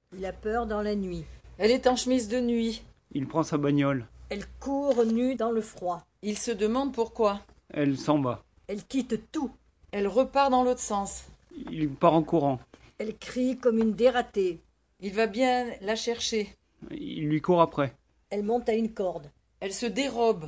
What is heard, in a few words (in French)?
ensemble